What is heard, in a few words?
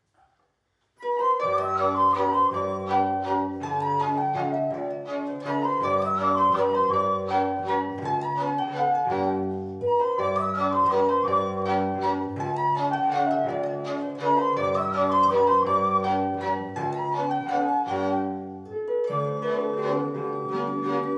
waltz